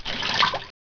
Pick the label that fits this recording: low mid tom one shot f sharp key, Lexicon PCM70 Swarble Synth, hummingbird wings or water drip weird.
water drip weird